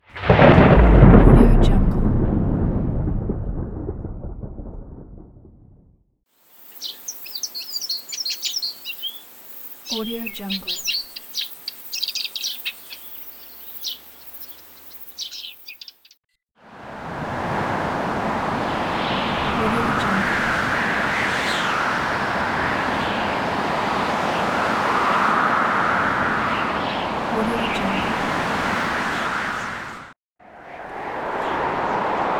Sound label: weather forecast sound effects